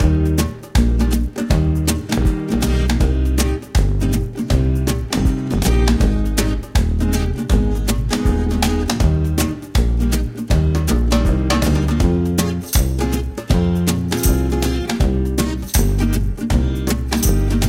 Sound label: Busking Boombox Play Loop; Quest Stinger